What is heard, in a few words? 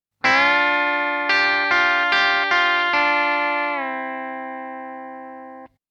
country